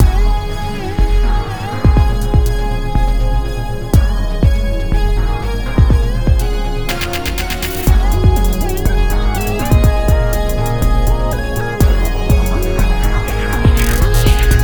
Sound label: bpm122